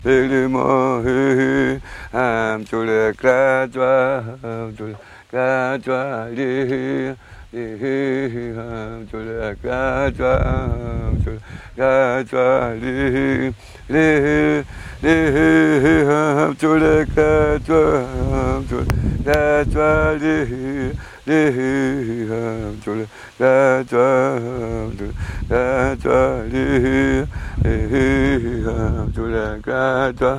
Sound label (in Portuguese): timbiras